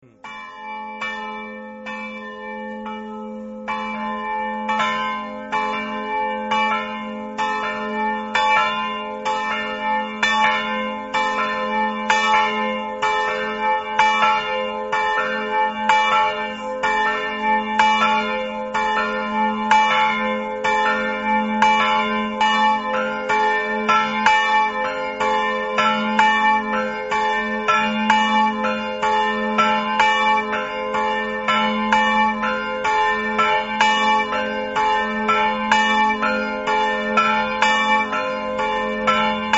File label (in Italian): campane; leproso